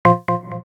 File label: check off; osu!; Android 4 Kitkat